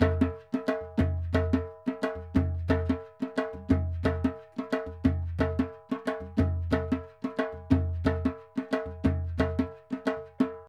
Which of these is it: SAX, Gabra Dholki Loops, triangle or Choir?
Gabra Dholki Loops